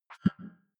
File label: click big bubble, signale